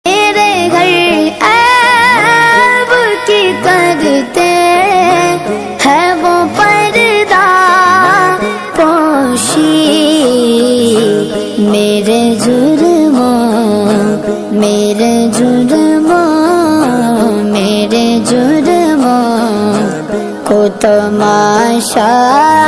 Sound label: islamic ringtone